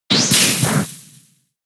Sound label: whip; vine